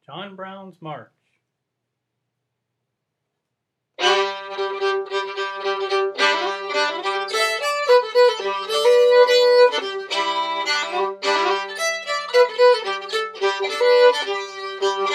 March, fiddle; festival tunes

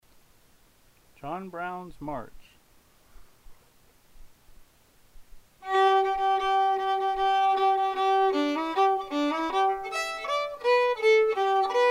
fiddle; festival tunes

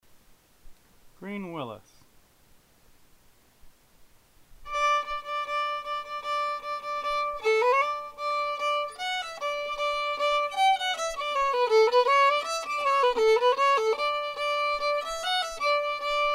fiddle; festival tunes

